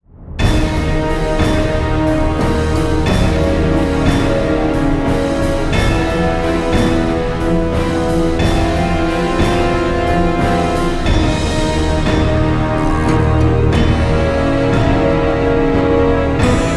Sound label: Dramatic short